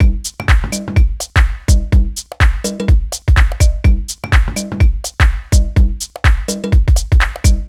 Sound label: Full Loop 125, Loungin House; Equipped Music